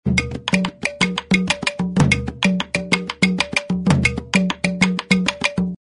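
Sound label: african gathering rhythm, percussion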